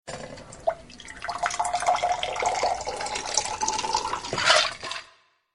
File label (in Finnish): tee